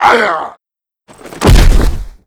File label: Die; Tamyu; Seer; GamigoNA